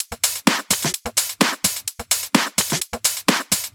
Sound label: Houseloops 128BPM; Vengeance Samples